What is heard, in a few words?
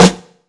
tight low end acoustic snare sound f key